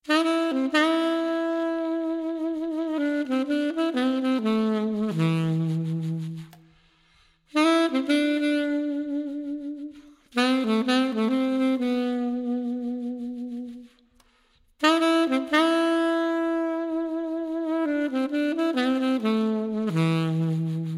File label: solo sax